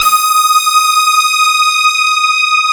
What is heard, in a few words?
CMI PIANO